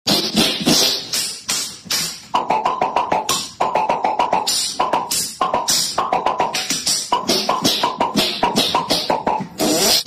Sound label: clapping; cat